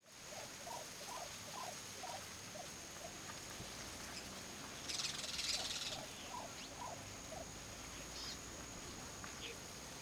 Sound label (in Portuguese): Passaros cantando e vento com folhas; aves; canais surround 5 1; Coleção Sonora do Cerrado